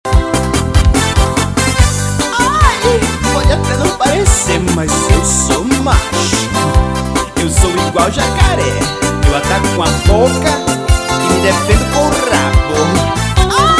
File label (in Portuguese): toque celular